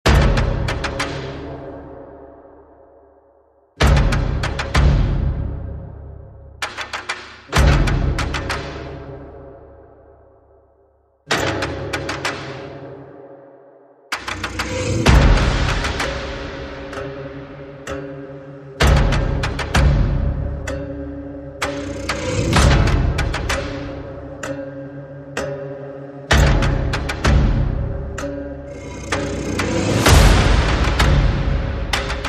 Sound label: Percussion and Effects